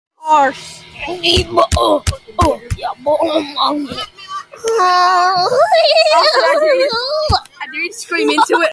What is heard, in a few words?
big farty